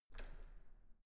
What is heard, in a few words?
entrance door handle up normal